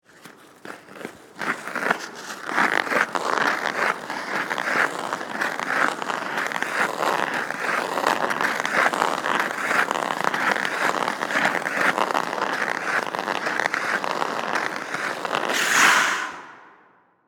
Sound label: Ice Skates Follow, With, Mic, Circle, Indoor, Ice, Rink; Sports; Ice Skating Sound Effects